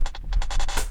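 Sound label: LOOP hi hat, Drum & Bass, 168 BPM; Ueberschall; Drum & Bass Resonance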